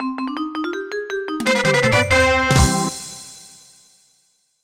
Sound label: Bonus Win